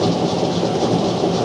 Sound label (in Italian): Veicolo, motori